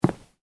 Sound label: Footstep wood light, Shoes: Individual Footstep On Wood, Light; Human; Footstep Sound Effects; Footsteps (Sound Effects:Human); Stuart Duffield